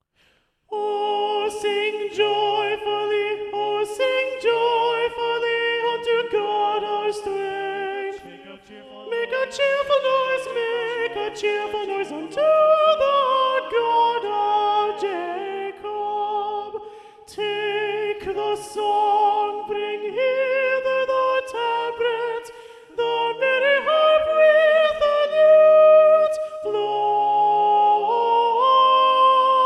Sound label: soprano predominant; batten; o sing joyfully; schola